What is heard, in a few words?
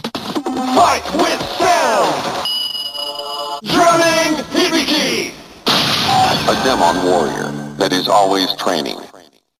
Drumming; Hibiki